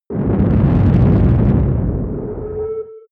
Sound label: spaceship flyby load warp; raumschiff